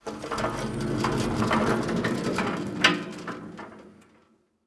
Emergency Gurney Hospital Gurney Rolling Down Hallway; emergency effects; AdobeCC